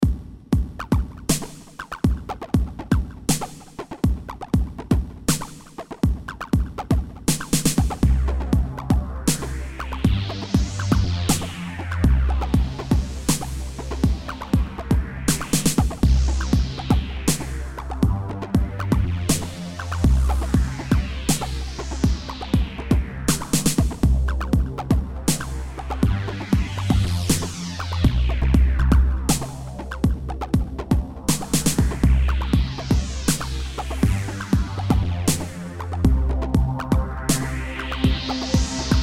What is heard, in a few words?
Emulator X3